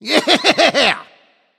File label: yay; smite; voicepack; khepri